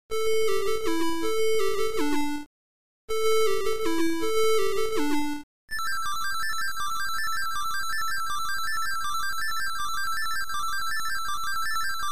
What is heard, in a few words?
msx psg